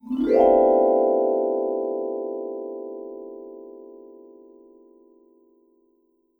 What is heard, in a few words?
GLS UP, VIBE LICKS; Sampleheads - Dave Samuels Marimba & Vibes